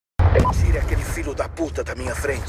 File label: kick; cod bo2 seals